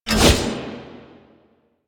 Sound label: Impale Cast; Warframe; Warframes; Hoplite